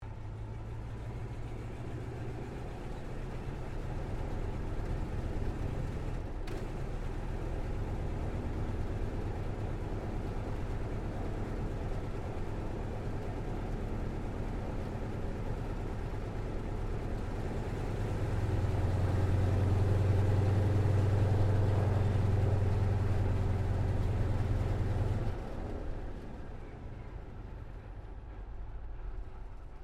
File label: AIRCRAFT CA-28 CERES SHUTDOWN INTERIOR; Airplanes